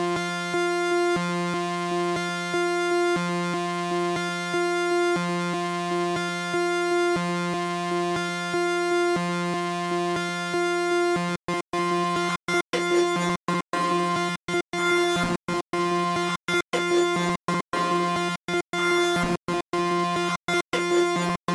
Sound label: Looperator Synth Live Stutter